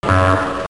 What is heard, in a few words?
fart